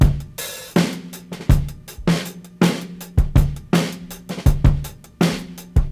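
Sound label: 81 bpm, beat loops; Zero-G; Monster Beats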